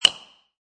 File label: Hammer Nail, Industry, Hammer, Hammering Nail Into Wood